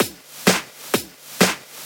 Clubhouse Loop 128 BPM

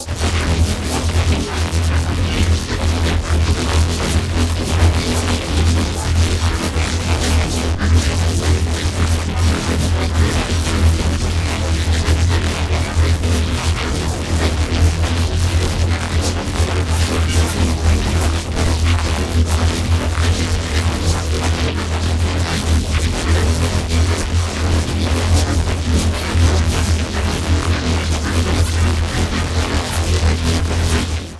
Bass Granulize E

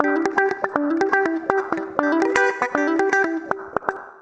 Acoustic Guitar Loops, 120; USB Soundscan vol.04 - Electric & Acoustic Guitar Loops; AKAI

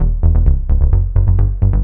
Bass Line; Eclectic Electronica

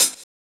CHH, DRUMSAMPLS, Disco Fever; Best Service ProSamples vol.48 - Disco Fever; AKAI

